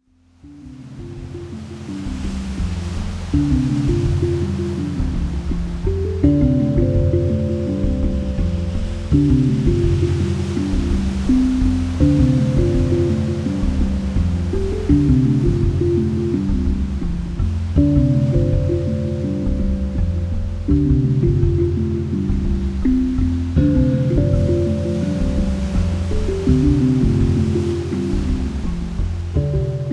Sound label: Drum 432 Hz, Healing Music